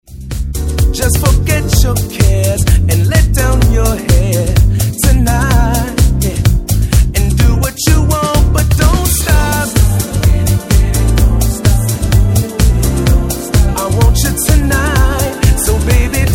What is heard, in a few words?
Vocal Mix